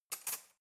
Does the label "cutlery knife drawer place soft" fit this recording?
Yes